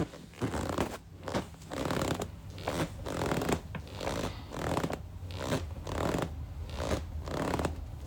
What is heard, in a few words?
leather rocking chair; Golden Crayon Sounds